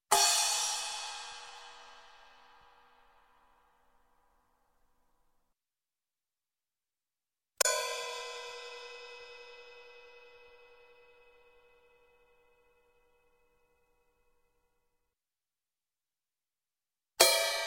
Cymbal Bell small Crash, Music, Percussion; Sound Ideas; Series 2000

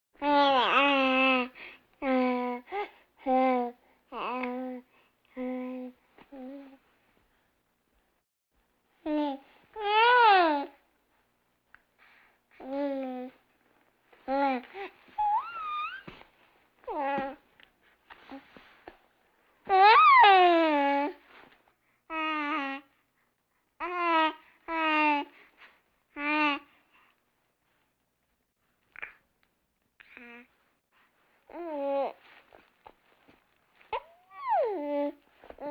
Vocal effects breathing Moaning Baby

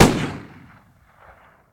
Gun, Hand, 357, Single Shot; gun and weapon sounds; captain audio; fully loaded

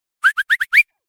Whistle Comedy Run Start; Hollywood Edge; sound designer's toolkit v2

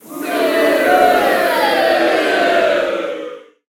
Crowd Boo; Pitch Black Audio Pong

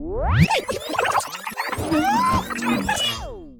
fast farward; Blind Drive

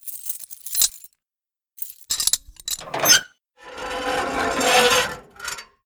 bridge gate open center; DeadFright

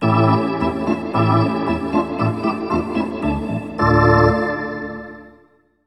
Hockey Organ

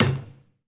Street Ball Wood Back; SFX Kit

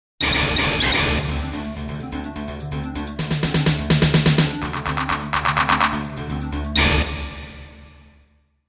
Jingles; 90 DDD Jingles

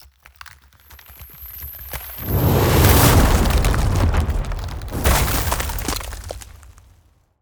Ice Spell Damage AoE Arctic Blast; Arctic Aura

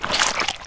Squish Grab Guts; SFX Kit; Foley - Misc

